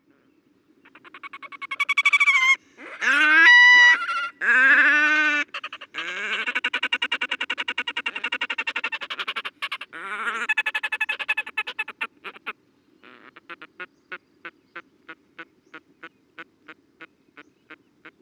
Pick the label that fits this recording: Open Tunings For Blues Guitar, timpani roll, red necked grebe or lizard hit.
red necked grebe